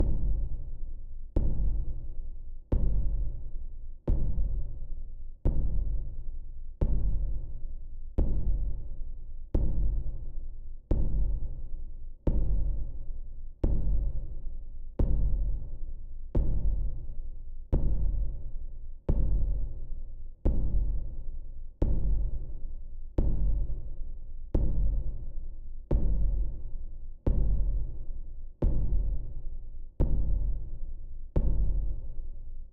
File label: Bass Drum (Compressed); song of iron